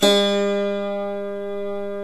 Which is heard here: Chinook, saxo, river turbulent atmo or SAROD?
SAROD